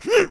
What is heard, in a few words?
jump